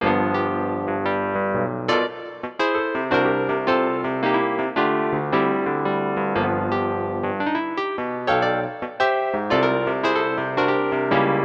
wurly, yamaha tyros3

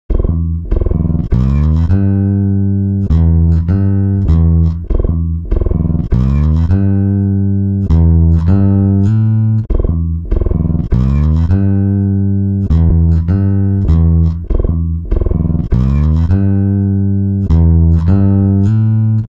bass; digidesign pro tools xform; timestretch

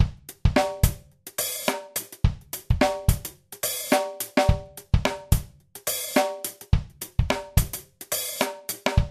funk drum kit; eastwest colossus

